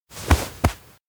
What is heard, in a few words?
chest tap; anemoi